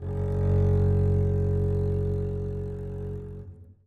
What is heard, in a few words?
gb1 down, bass arco; meatbass